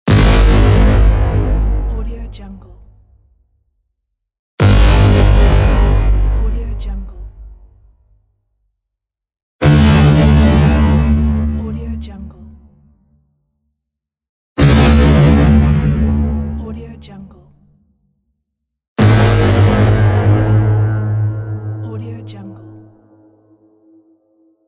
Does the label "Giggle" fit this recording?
No